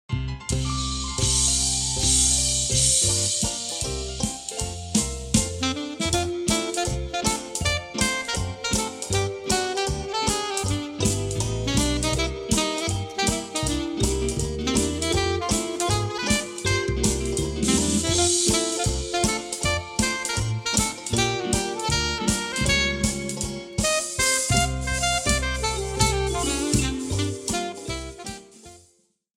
alto sax

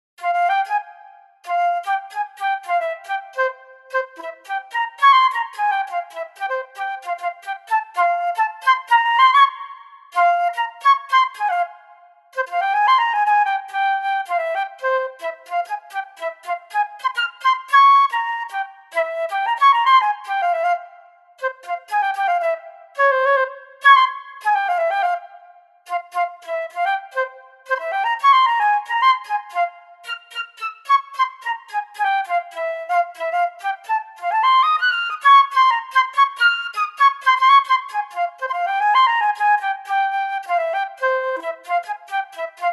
Flute; 52 MELODIC ETUDES-FLUTE Bk 2

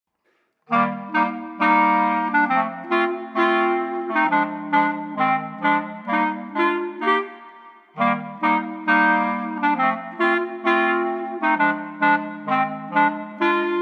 Clarinet Trio

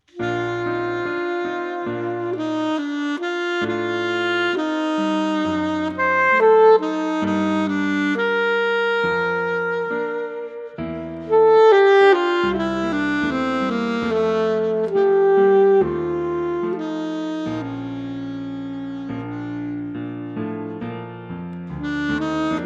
alto sax

